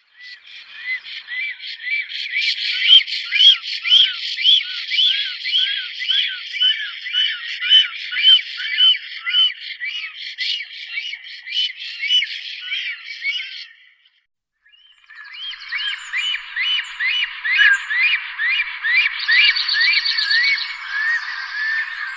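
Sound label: bird voices, black stork, Ciconia nigra; aist chernii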